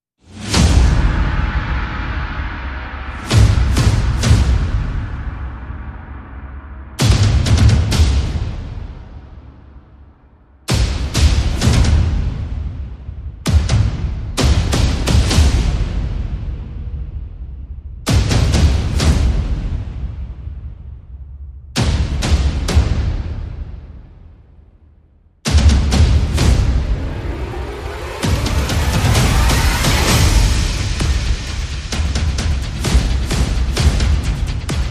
Perc and FX

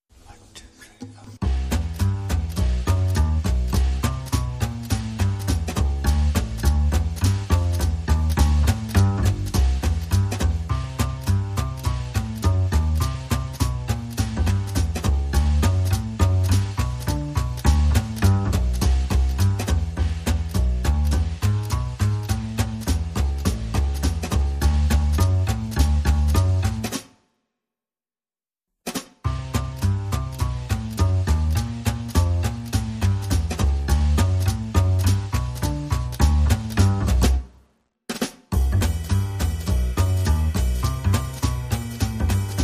Clarinet Version

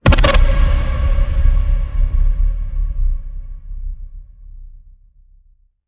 Distorted Siren Ping

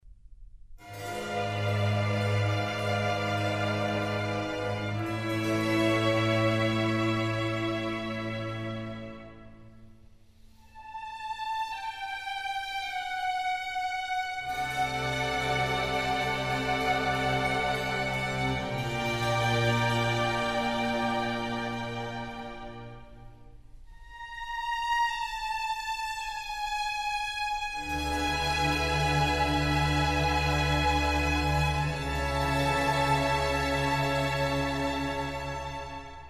Concerto for Strings in D minor; RV127; Antonio Vivaldi

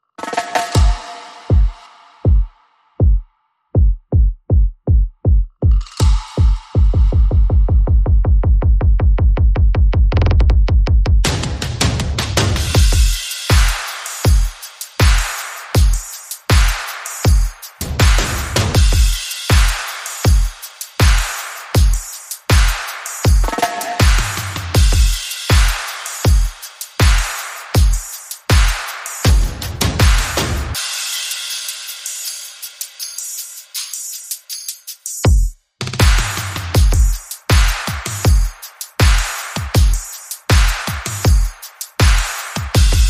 Perc and FX Only

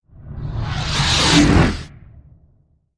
Sting Growl Sweep

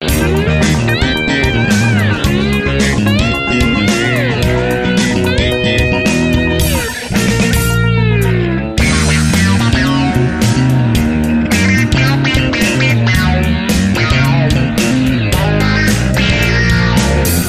Mousey Cat Funk

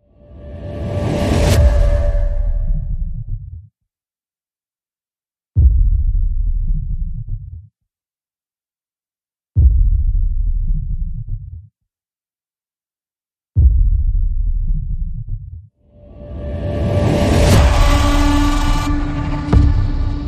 Perc Only

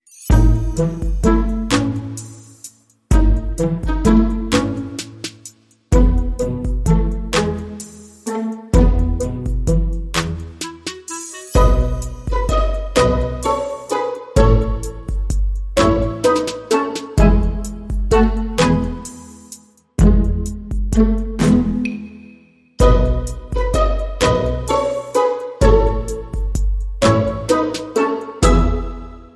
Modern Percussion

